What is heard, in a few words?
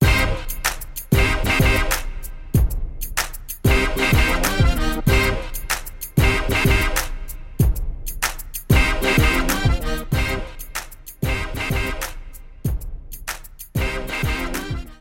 Teaser Male Music; Rickey Smiley For Real